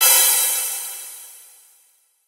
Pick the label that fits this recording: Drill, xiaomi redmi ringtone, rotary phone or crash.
crash